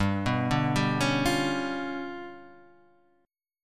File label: G 7th Sharp 11th, chord; G7#11 arpeggiated; JGuitar